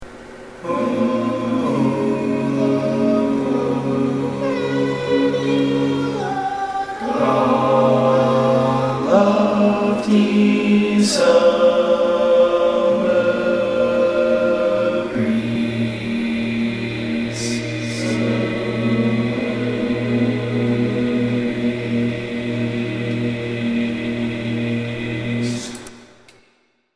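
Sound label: Baritone, barbershop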